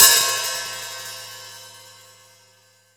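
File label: MIX open hi-hat, OLD HH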